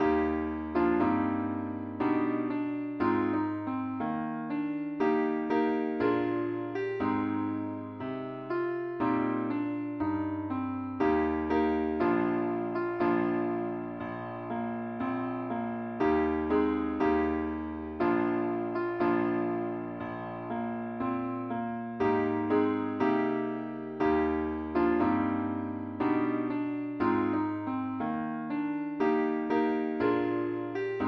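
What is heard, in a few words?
ukelele key e flat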